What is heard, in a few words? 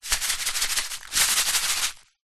food small packet of cous cous shake; zapsplat